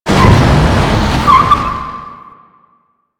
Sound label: Lasrian APC Move Stop; Enemies; Warframe